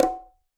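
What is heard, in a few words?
Bongo Low Flam; Latin Percussion; Drums; Drum Hits; Ableton; Factory Packs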